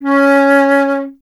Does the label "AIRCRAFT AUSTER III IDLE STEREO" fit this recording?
No